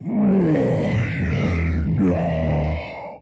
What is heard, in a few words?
Grunt